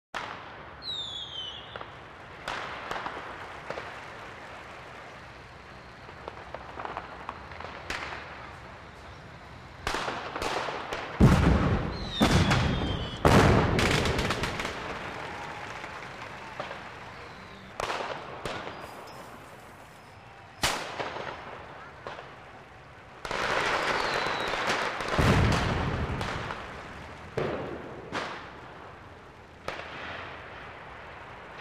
Shots of fireworks and the noise of a crowd of people; explosions